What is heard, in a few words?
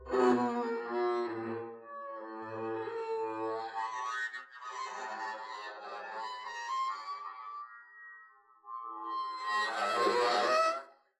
arco noise extra high screechy extra long, double bass; dsmolken; noises